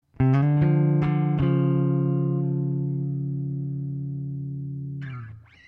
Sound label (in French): clair riff; riff son clair